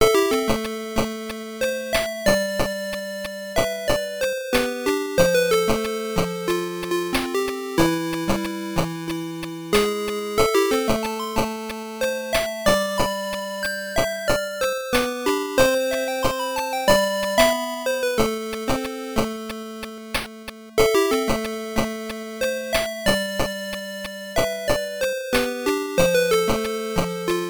you lose; clutch hitter; game gear

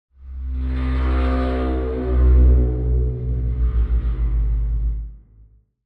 Elimination Stinger

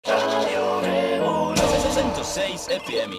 Radio Car Brazil Jingle

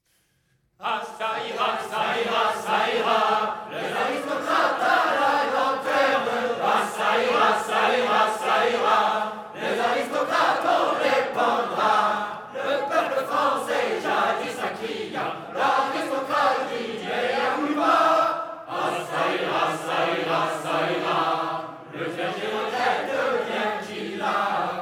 Choir; Assassin's Creed Unity

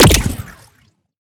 Shot Small Blaster Energy Strike; Robobeat

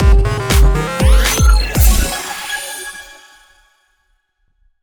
ui map accomplished; Spacebase Startopia

